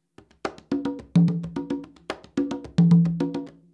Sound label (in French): rumba ternaire, congas